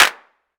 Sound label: small reverb clap single shot a key